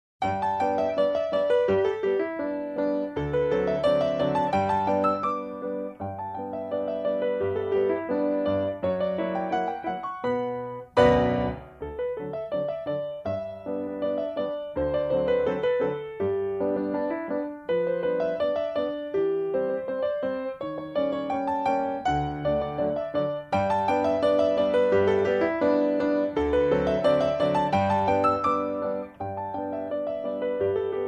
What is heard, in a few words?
Blues